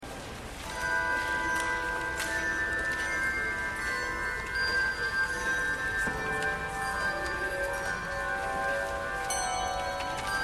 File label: Procession, Carillons